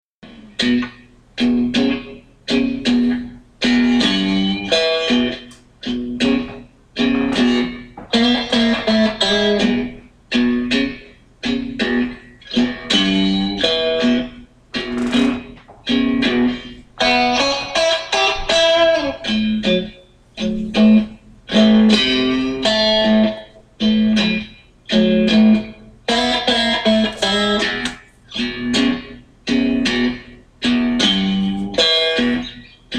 slow blues shuffle

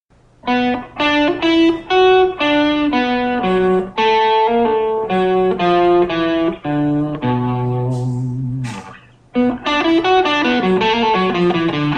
useful srv style blues lick, guitar

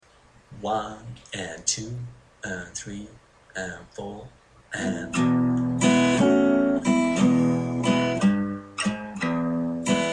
acoustic blues guitar lesson

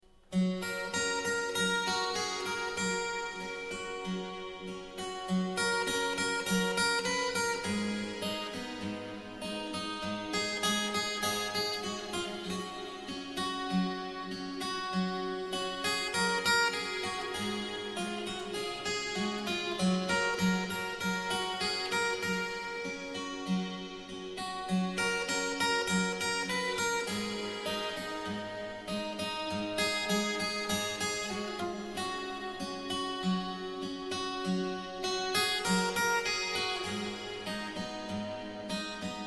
fingerstyle

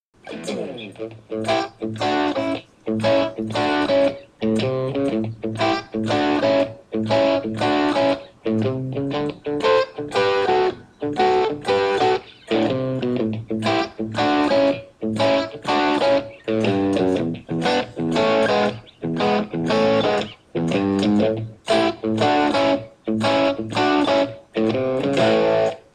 blues shuffle fill chords fill it, guitar

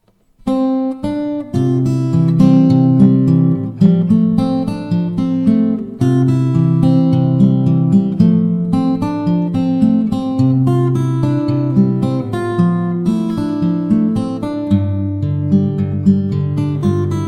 fingerstyle, guitar

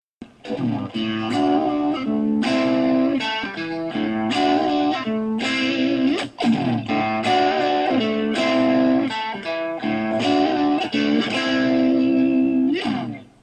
double stop bends

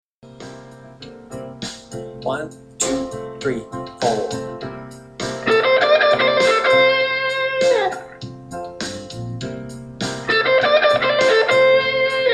licks in the key of c style, guitar

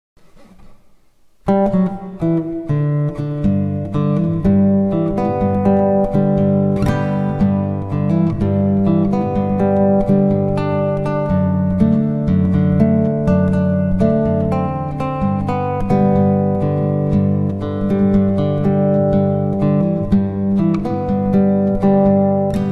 fingerstyle, guitar

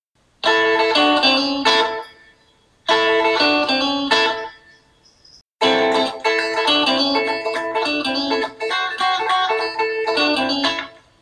rockabilly lick

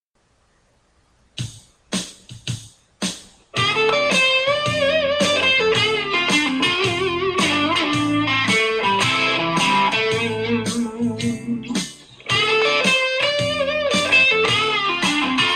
style lick

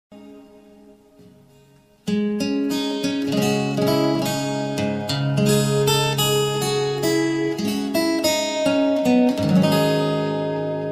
fingerstyle, guitar

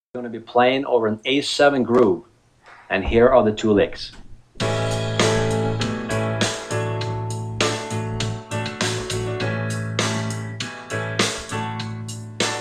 licks played in the key of a

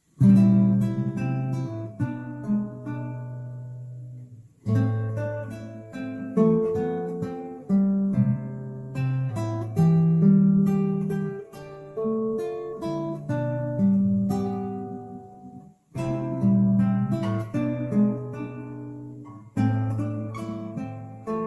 waltzing matilda fingerstyle, guitar

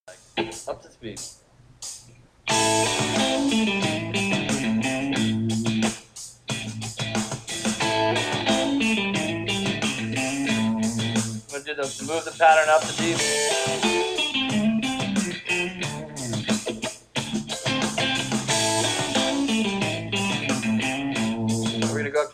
blues song riff

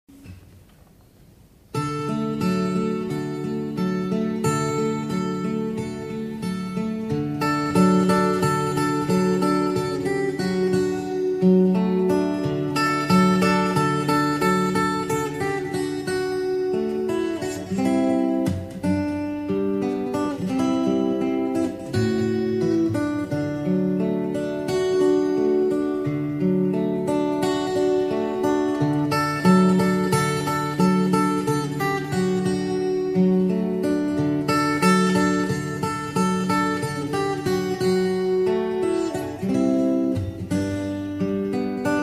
fingerstyle

